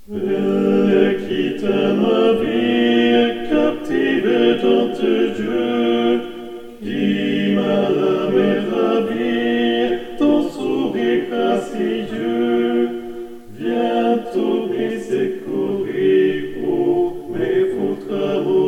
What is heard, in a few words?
Pavane